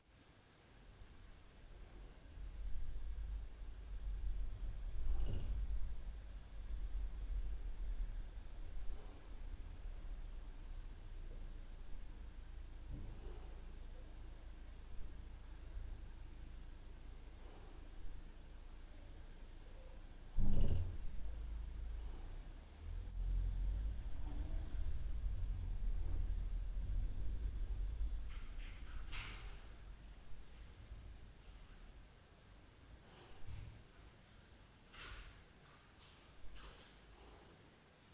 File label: LF UPSTAIR + DOOR NOISE; Night Noise